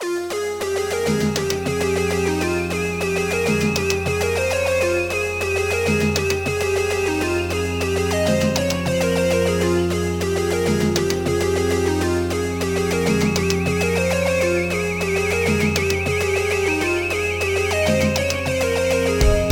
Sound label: BGM Fight; hyperspace-roll